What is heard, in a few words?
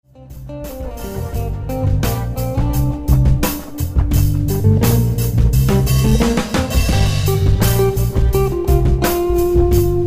Dub; MoodDocs